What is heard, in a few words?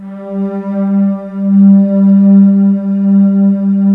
KORG WAVESTATION